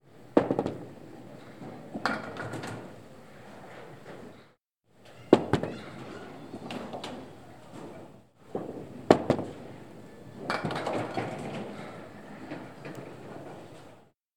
Ambiance Bowling Alley Tossing Ball And Strike Multiple Versions